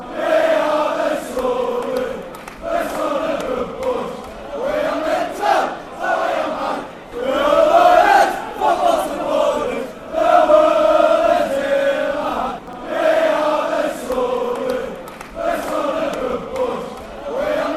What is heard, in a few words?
chants; sunderland bootboys